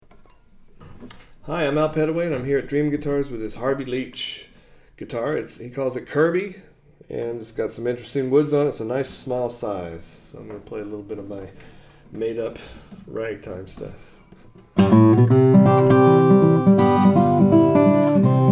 leach kirby, steel string guitars, flattop

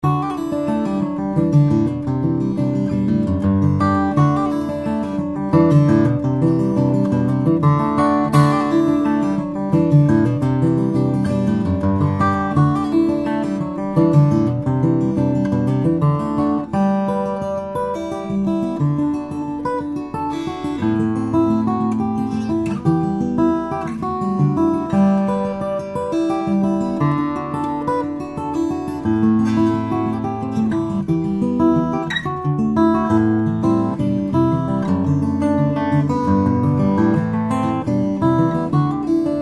somogyi mod d, steel string guitars flattop; modified d fingerstyle dread jumbo

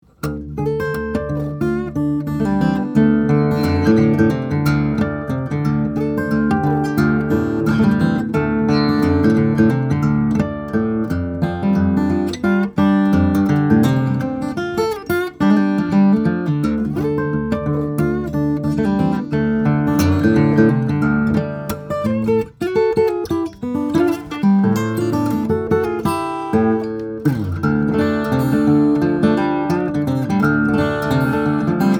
guitars; pre war guitars co 000 18; mahogany; adirondack spruce

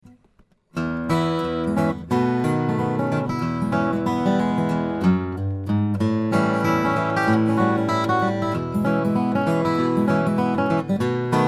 steel string guitar flattop; lipton c

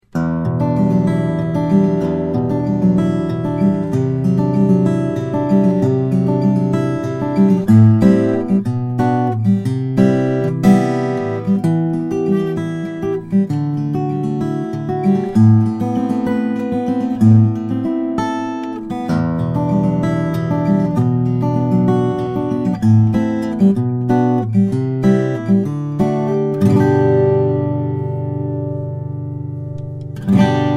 steel string guitar flattop; williams kiwi whitebait

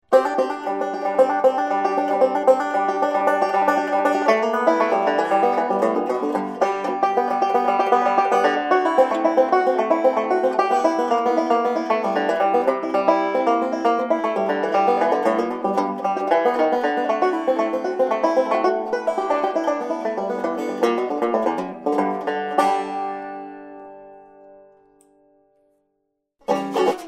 custom 6 string, banjos; bishline

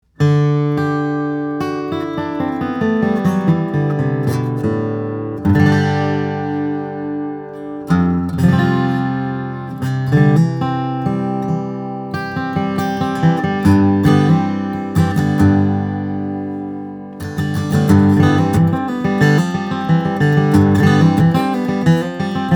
Greven Dreadnought, guitars; 1985; cocobolo; spruce; steel string guitars; flattop

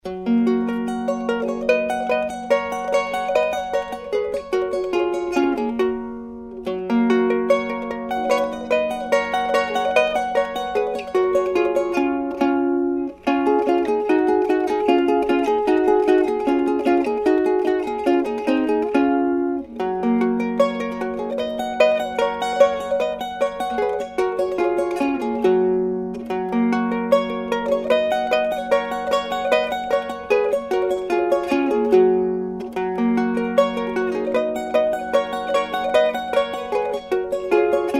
baroque mando; albert mueller

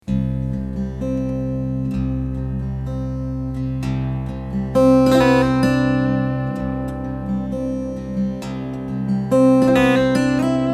emerald chimaera, steel-string flattop guitar

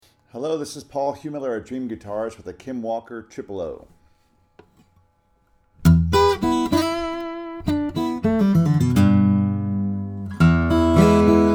walker 000, steel-string flattop guitar

